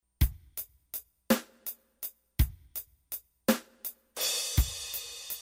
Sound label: crash pattern; Programming Drums On The BR-1600CD